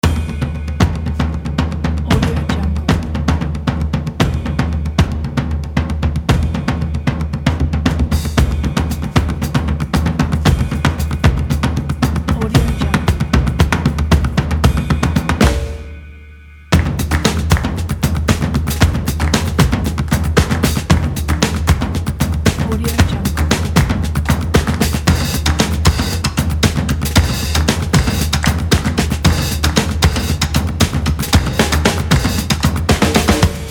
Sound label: creative drum beats pack